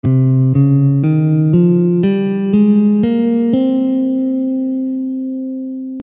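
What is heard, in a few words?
Phrygian C, Gammes & Modes · Guitar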